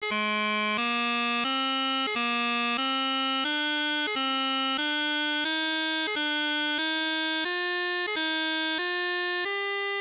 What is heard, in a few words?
Scale Exercise, piping